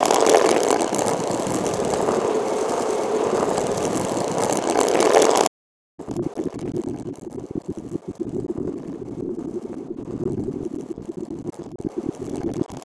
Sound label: Stone Roll; Resynth